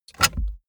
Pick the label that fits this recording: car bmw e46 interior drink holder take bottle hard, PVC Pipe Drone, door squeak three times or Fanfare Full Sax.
car bmw e46 interior drink holder take bottle hard